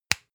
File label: spice jar smack close